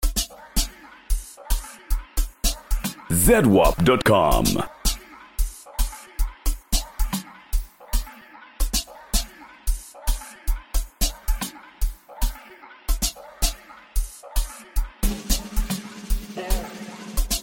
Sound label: halloween bells